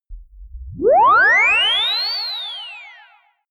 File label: quick liftoff ufo